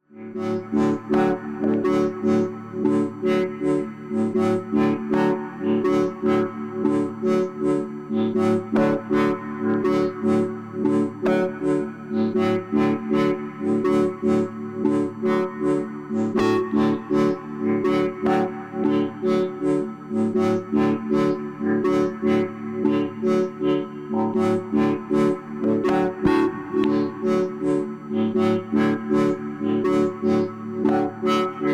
FM Synth Reverb Filter Modulation; Preenfm3

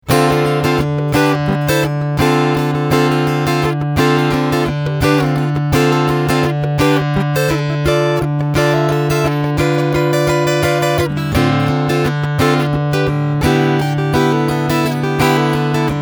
PRS SE Hollowbody II Piezo Strumming Piezo

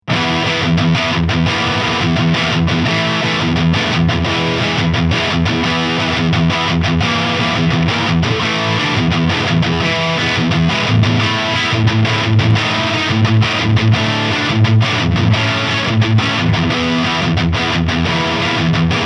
Lead; Solar Guitars E1.6AAN

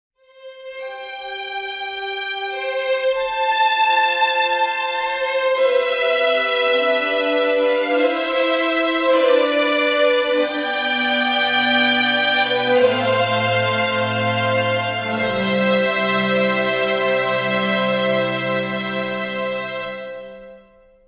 Chamber Strings Quintet Far

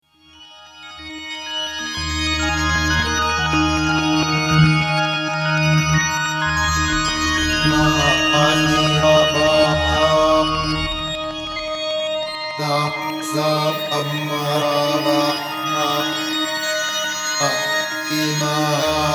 Eventide H3000 Reverse Shift Synth Voice

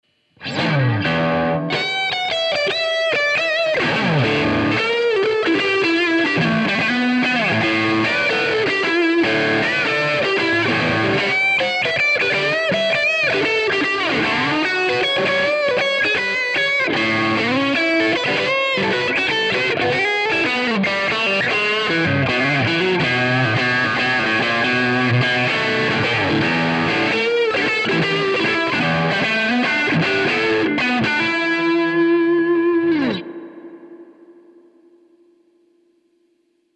overdrive blues boost combo; ToneMasterPro

